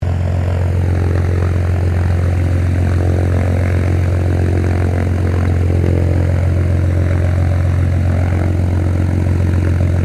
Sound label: AIRCRAFT PIPER CHEROKEE 6-RG TAXIING CLOSE, Light Civil aircraft; Airplanes; Vehicles